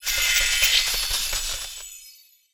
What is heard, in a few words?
Shimmerfly Attack